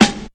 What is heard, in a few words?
smooth acoustic snare a key; snare-drum-samples